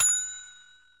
vaucous, Bells; Misc Percussion

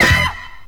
shouting, Stabs

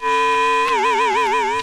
hum wobble; Zaps and Beeps

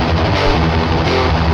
guitar, Jazzmatazz loops

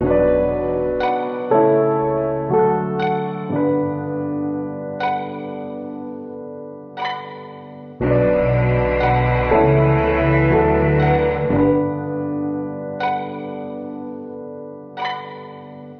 piano guitar keys sung, sad loop; loops

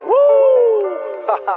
woo ha ha; ooh ahh; ho hoo woo

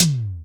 Toms Electro

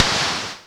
digital gunshot; Zaps and Beeps

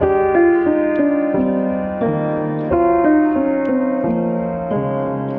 piano, Sad

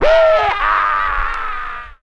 woo ha; Voice; ooh ahh

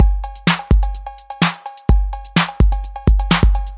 paltry basic, Drum loops, Electro

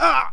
ah; ooh ahh

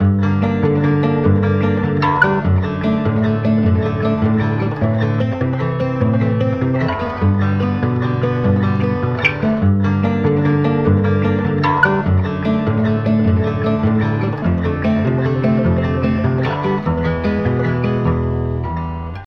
guitar, Sad; Loops

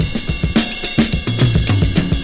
Drum loops, Funky

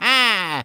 ah

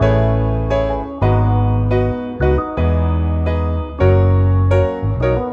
piano, Loops; Phonky

